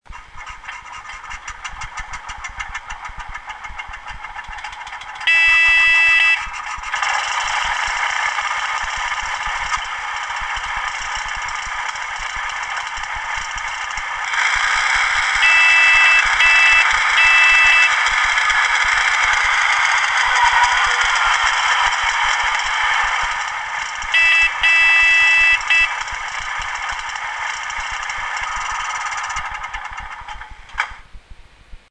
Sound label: draisine, loksound